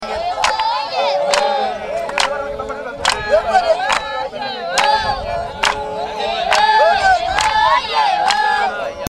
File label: samburu tribe